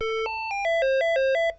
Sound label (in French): sonnerie fixe